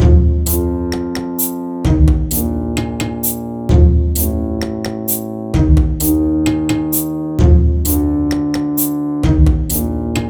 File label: Mood Music